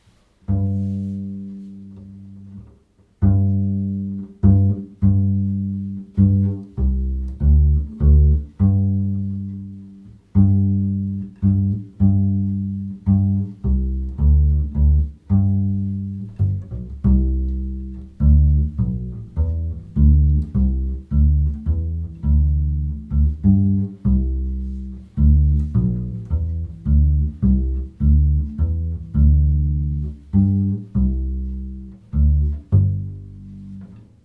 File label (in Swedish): Altarpolska bas